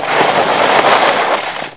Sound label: Snow Ski; iLinux